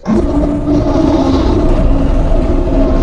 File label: Door Large Metal Loop; SFX Kit; Doors